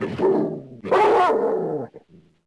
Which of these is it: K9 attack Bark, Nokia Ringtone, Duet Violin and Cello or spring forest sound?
K9 attack Bark